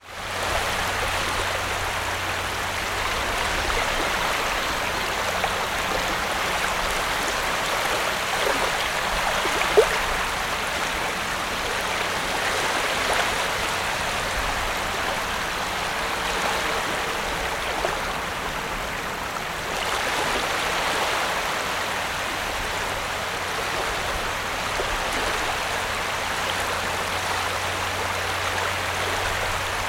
water ocean waves small constant sand shallow incoming tide birds distant plane